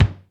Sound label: BRUSH KITS; Crypton - DrumBase5000